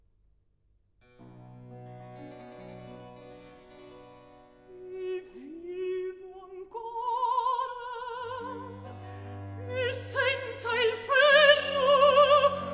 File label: Recit.; E vivo ancora; Handel; Ariodante, HWV 33